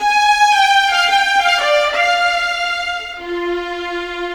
Viola, Violins, Orchestral, Rock Pop; Sonic Foundry (Sony Creative Software); Orchestral Series VOL-4 - Rock and Pop

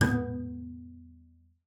B LEG B3; BASS COL LEG; Xsample VOL-5

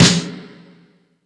Stadium Rox, Drum Machines, E-MU Pro-Cussion

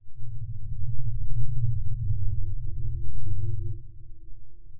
Fireworks Ufo, Ethereal Textures; Sonic Foundry (Sony Creative Software); Ma Ja Le; Soundworlds

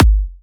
Electro Kicks

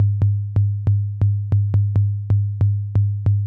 SINE BASS, Techno 138 BPM; Best Service ProSamples vol.54; AKAI

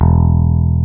Basses, Roland

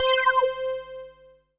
Dance Floor Bass, Multisample Basses

